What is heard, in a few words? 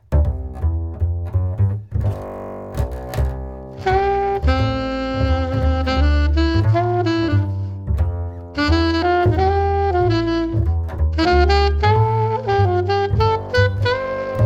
solo sax